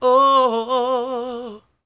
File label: CROON, Soul